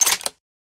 barrett switch; weapons

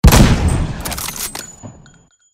mosin fire; weapons

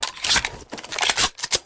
micro uzi reload; weapons

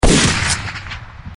cz600 fire; weapons

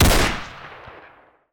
m1 garand fire; weapons

